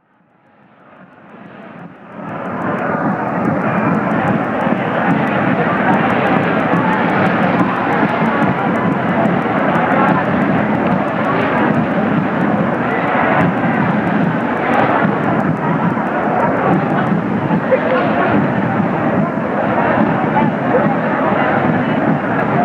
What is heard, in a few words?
shrine dictorphone mexico, street recording